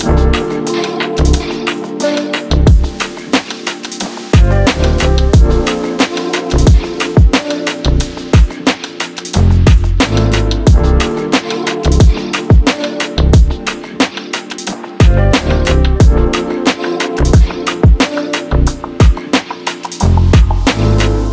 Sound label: chill travel